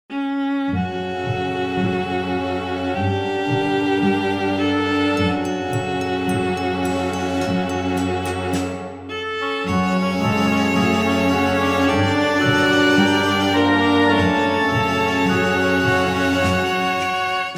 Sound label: kompakt orchestra; KOMPAKT Orchestra Demo by Andy Davies; native instruments kompakt